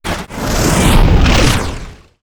wooshes transform sweetener; soundmorph

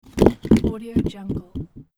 rocking rock

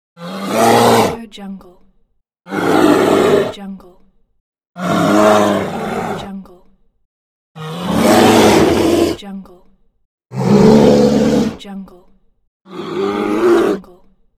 bear; pure-noise

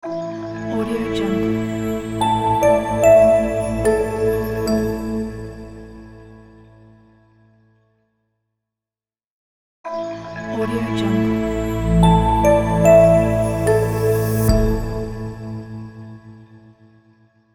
dream cinematic logo

